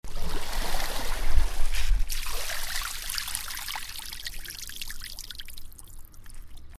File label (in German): wasser durch wippe